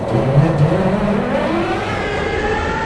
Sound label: engine stall, train, class 66; OpenBVE